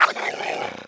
throwable attack

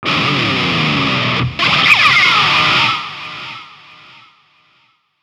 guitar solo no verb; Pyro

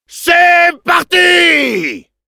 Demoman battle cry, fr; C’eeeest partis !

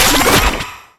Killsound squasher

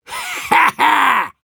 Demoman laugh short, ru

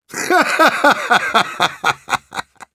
laugh happy

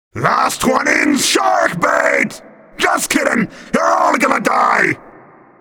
Pirate ship move; "Last one in's shark bait! Just kidding, you're all gonna die!"

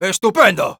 negative vocalization; Sniper

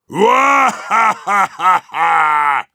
Heavy laughter big, ru

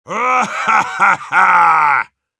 heavy cheers, ru

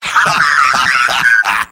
laugh happy; Engineer

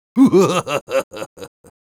Sniper laugh evil, es

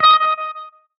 Hitsound menu note

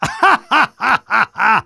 laugh happy, Spy

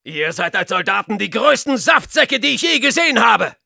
jeers, de; Soldier; "Ihr seid als Soldaten die größten Saftsäcke die ich je gesehen habe!"

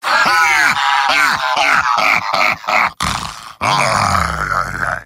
Heavy laugher big snort

